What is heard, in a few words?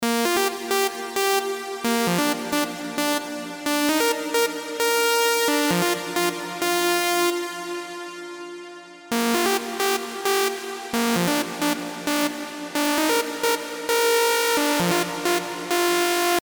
LFO Pitch Modulation